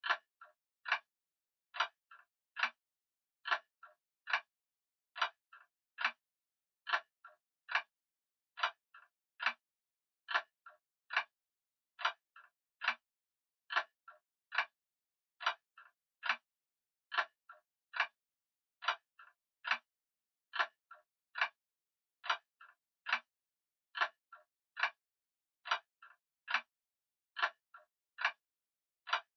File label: Horror Grandfather Clock Ticking Tick Tock Distant; Scary Soundscape